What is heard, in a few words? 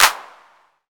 Ambient Clap Single Shot C# Key, Royality free clap sound tuned to the C# note; single hits one shots